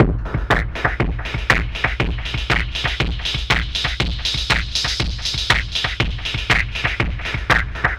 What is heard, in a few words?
philter beat sweep; Sonic Foundry (Sony Creative Software); Ugly Remnants VOL-2